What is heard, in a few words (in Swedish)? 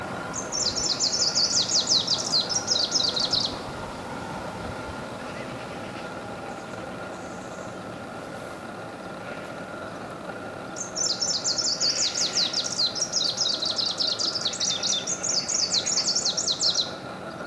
Lundsangare